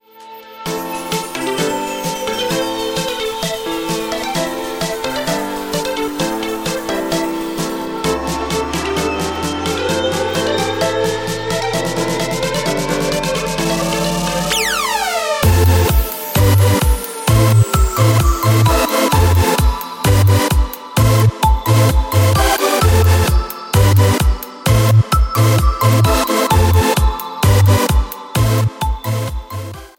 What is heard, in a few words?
Bar trend cool electronics, ringtone; MIUI12